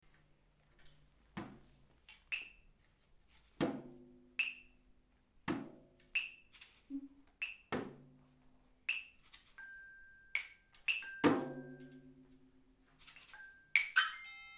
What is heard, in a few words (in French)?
Improvisation en soundpainting; PROJET ÉDUCATION MUSICALE EN MATERNELLE ET ÉLÉMENTAIRE